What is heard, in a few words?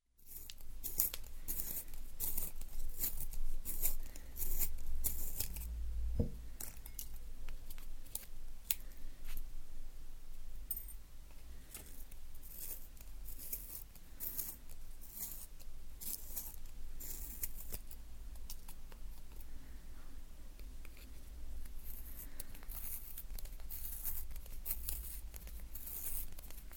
sharpening a pencil